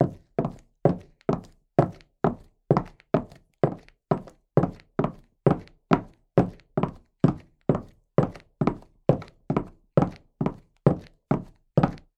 Footsteps Female - Stilleto Heels Wood Floor Regular